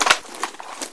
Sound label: gun pickup, items; svencoop; echoes